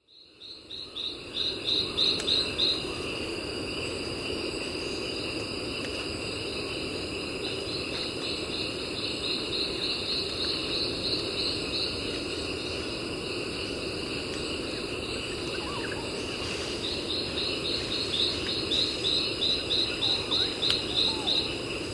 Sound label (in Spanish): paisaje mañana cativera; Gandoca-Manzanillo; historia natural